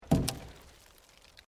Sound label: Wood Logs Movement Minor; Material components for constructing more complex sounds; Materials